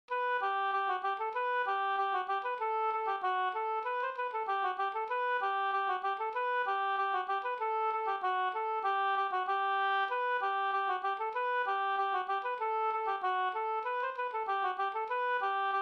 polka polkett; e Edvard Pettersson Sd

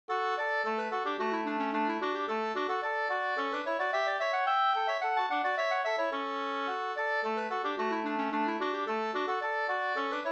polska Bingsjopolska; Hobergsgubben 2st

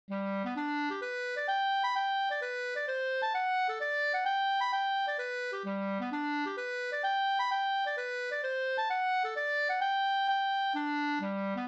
mazurka; Svalans middagssovel SvL Nk221

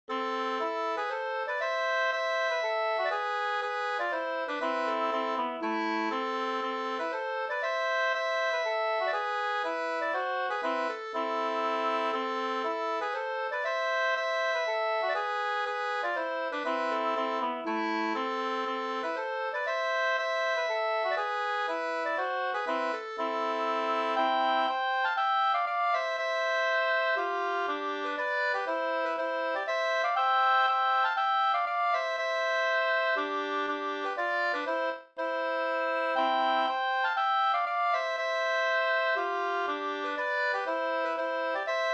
polska Bodapolska polska; Furuboms 2st

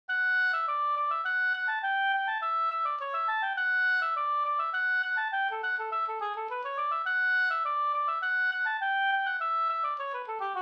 slangpolska, D dur

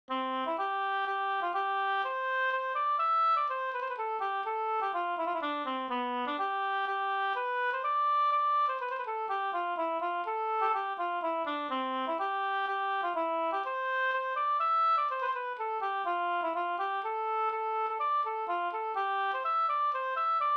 polska

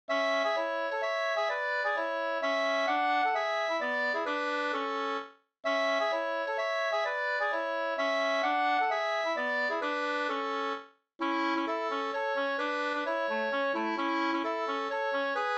slängpolska

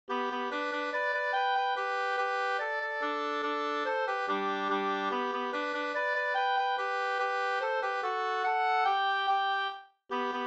mazurka, 2st

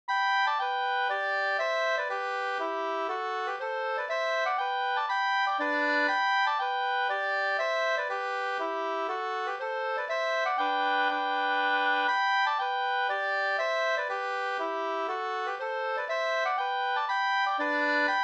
polska; fr Adelso

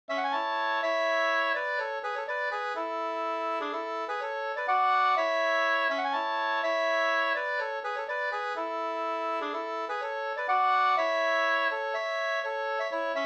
polska Brudpolska

